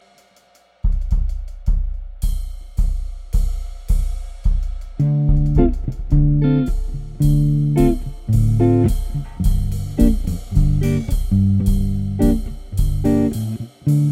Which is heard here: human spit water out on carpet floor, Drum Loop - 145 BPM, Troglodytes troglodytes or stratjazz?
stratjazz